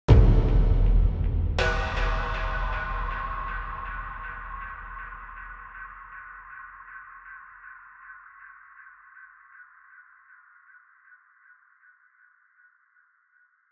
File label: sound design hit cinematic big dark